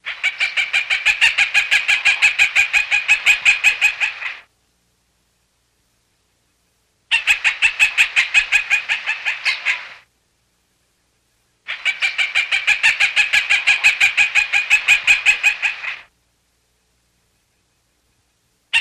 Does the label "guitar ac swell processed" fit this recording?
No